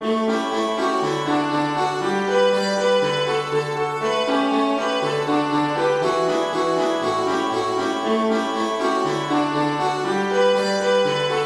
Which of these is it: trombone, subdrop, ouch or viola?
viola